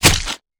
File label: Spear Skin, Damage